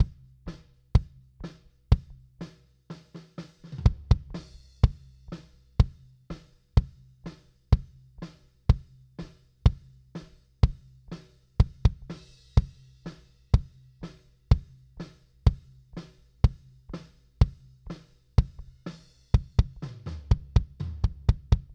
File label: kick